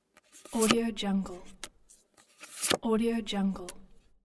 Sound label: dealing cards